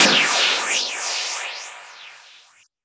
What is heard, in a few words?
FX Downlifter